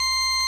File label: ORG VOX C, Continental